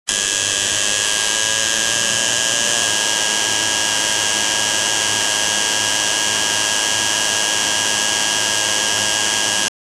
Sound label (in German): eine DB BR 1445 sound, locomotive